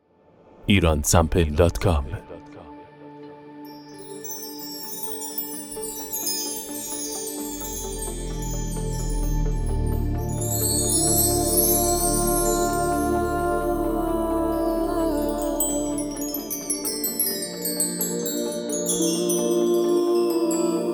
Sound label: Chimes